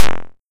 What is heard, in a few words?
Kick Fx Techno